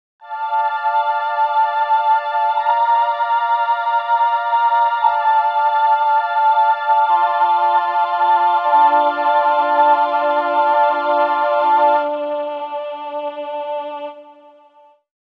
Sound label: Vocoder Plus female; XILS